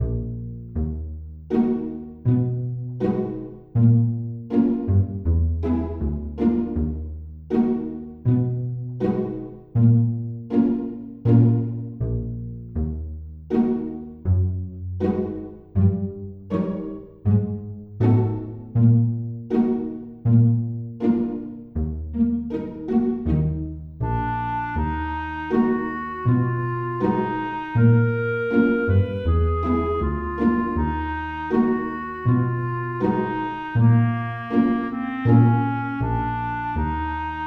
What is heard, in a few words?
Cello Music